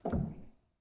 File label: rel, Grand; bitKlavier; Binaural